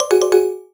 Error; Aime Error

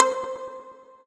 Pluck

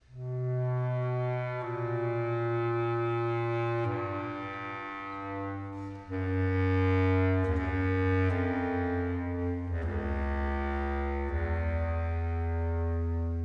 Solo Overlay Phrase 80bpm 4-4meter; Planet of Lana

